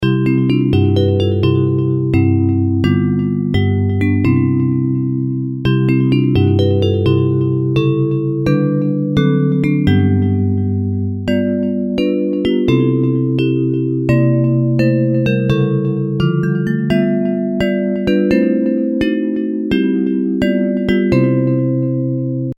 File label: Bells